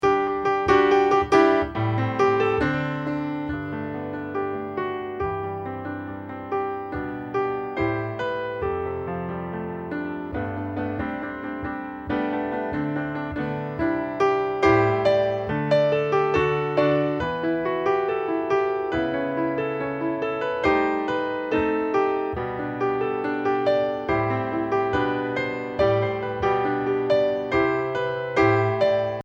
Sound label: accomp